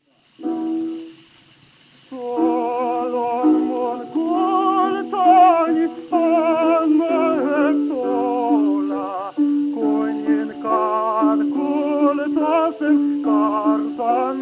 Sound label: Gramophone